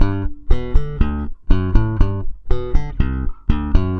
SM BS G, BASS SAMBA

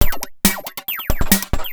percussion loops, Techno; PS-45 WAV Techno ID

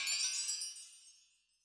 Wet Metal; Yamaha DTXpress-II

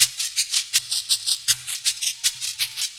Shaker, Percussion Loops; Ambient Grooves

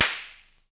Snare, Univox SR-95

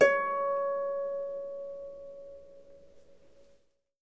Harp C#5; Miroslav Vitous - Percussion & Harp

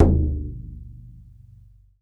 BODHRAN, Celtic Percussion; E-MU Producer Series Vol. 7 – Old World Instruments

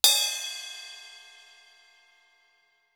sfx perc

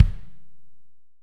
AMB KICK; ST AMB KICK

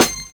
MANDOLIN; Univers Sons - Kitch 70s

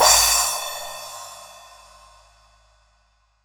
Crash 36cm, Drums; Polyend; Simulacrum Sounds; 1 Shots; Acoustic and Electric

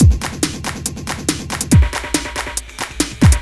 Beat, Drums & Percussion; Electrocution; Beats

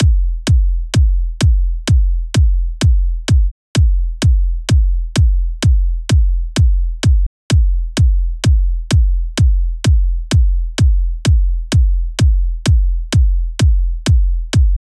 Drum Loop 128BPM BD; Drumloops; Multi Loops; VEC5

